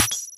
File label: Jewel